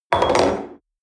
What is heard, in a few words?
espresso upset table medium, restaurant cafe